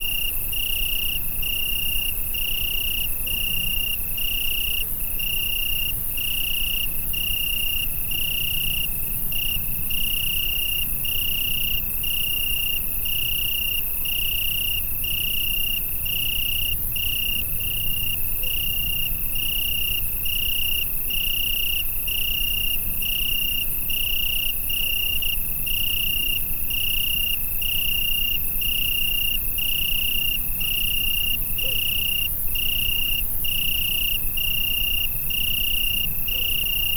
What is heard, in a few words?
animals insects summer night ambience france july